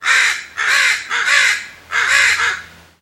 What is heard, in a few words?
Atmo Random Crow